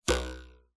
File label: cartoon twang spring ruler